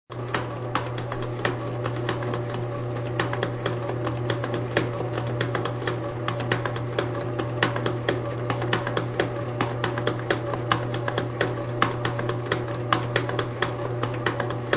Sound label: Bread maker running.; Kitchen; Sound Effects:Household